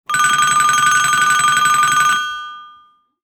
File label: doorbell